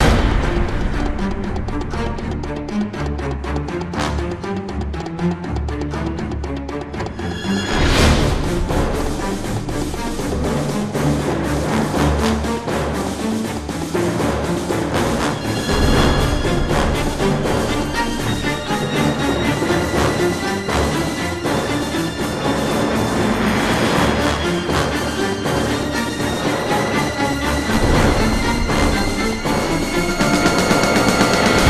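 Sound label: STRINGS PERCUSSION loop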